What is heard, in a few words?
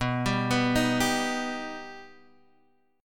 B Augmented B Guitar Half Step Down, chord